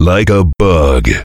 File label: heavy laugh happy; tf; duke